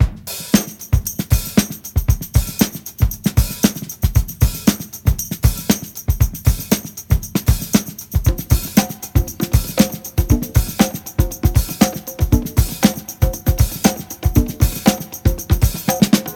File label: 117 bpm modern drum loop a sharp key